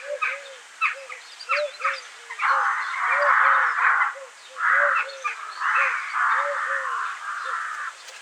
Birds Various, Birds

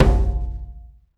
HYBRID, Heart of Africa; Spectrasonics; AF.HYBRID

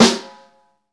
snare, Roland

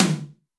tom, Zoom MRT-3B

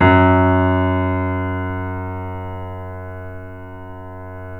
F#, Piano; InVision Interactive - The Piano

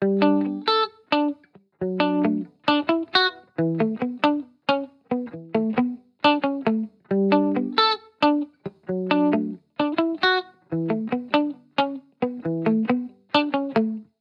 Guitar Bpm135 Key D, 70s Guitars; Fox Samples - Back to the 70s Guitars

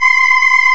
CHOIR; East West; Global Sounds

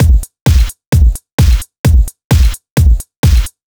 Drums, 130bpm, Nu Dance Electro; Zero-G; Soundclash; Combi Loops; Insania

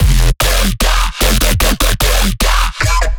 bass loop 150 E; Virtual Riot

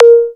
NOISE; AMG - Vince Clarke Lucky Bastard

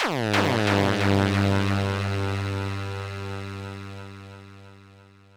synth, Hardcore Breakbeat 134 F#min; Big Fish Audio; Hardcore Breakbeat Action; Trancefunk Mutator

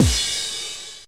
Crash; Drums; ZERO G - Datafile DISC 1

